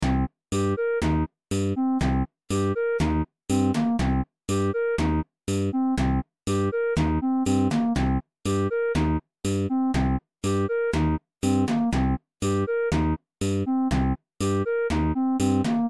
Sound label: funky leap; Picture Puzzle